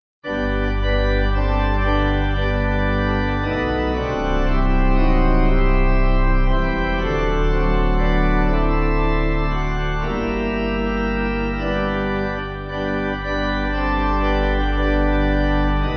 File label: Pipe, Pipe Organ